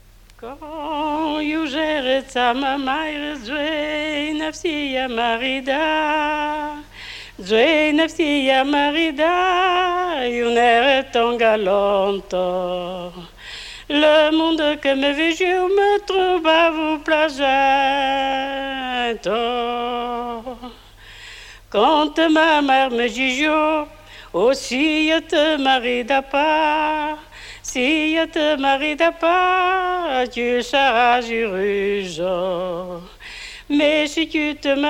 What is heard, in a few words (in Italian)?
canto; france